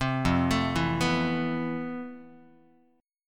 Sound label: F Major Sharp 11th, Guitar; arpeggiated